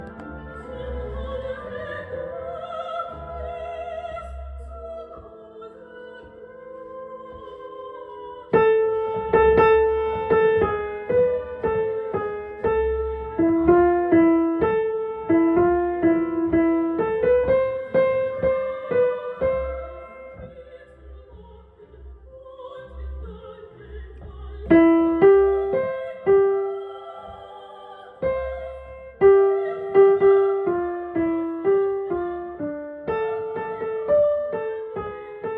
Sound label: piano; Mendelssohn; Alto